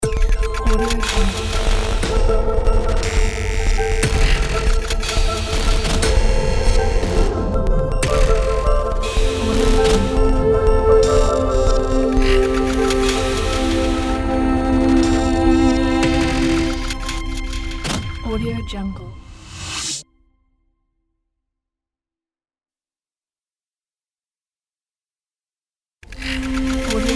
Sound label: transformer ident; j-fg